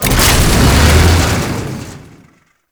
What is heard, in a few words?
Tank Movement Start; Enemies; Lasrian Tank; Warframe